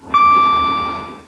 AWS bing, train, class 66; OpenBVE

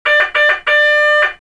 Adjust

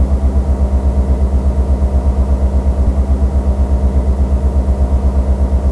Motor, Train; GP38

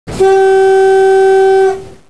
klaxon, Class 47; OpenBVE; Train

